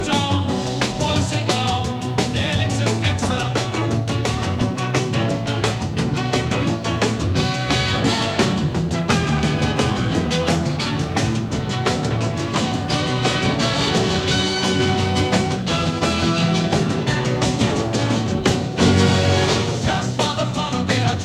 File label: A Drinking Song; MegaEpix Enormous